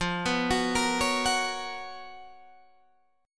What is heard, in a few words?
C sharp 13th F